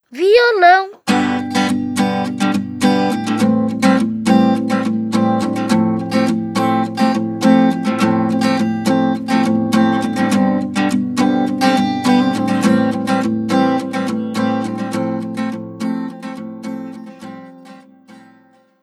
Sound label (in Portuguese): VIOLAO